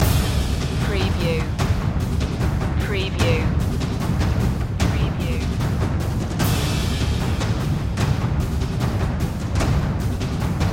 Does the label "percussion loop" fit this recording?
Yes